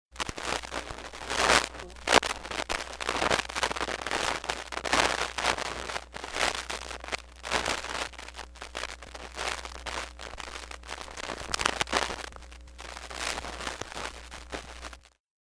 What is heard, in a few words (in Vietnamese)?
Am thanh nhieu tin hieu